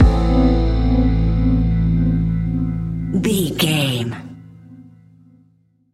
relax dreamscape lullaby stinger, A relaxed and chilling piece of music